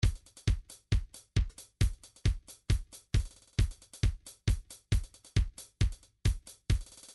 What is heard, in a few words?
trance midi loops beats hat riff; Newtronic Trance Constuction Kit